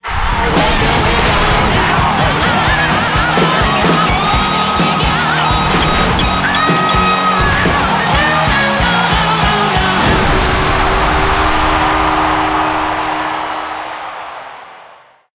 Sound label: bonusgame Intro; netent